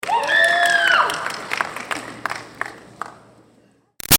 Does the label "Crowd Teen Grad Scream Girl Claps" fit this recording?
Yes